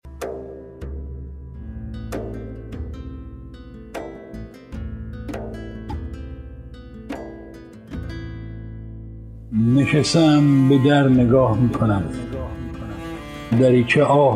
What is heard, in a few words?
Vocal